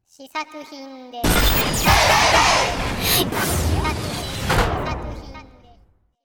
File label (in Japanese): 効果音